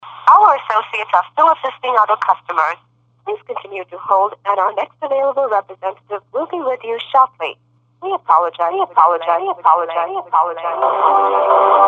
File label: Hold Music